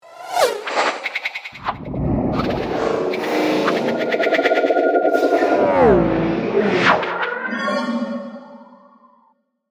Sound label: Slash Dash; boomboxstable